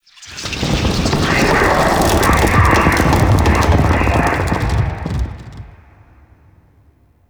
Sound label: Tree Creak Speak; Midrath Island; Wevetwood